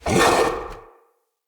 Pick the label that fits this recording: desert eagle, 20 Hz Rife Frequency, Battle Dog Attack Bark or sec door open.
Battle Dog Attack Bark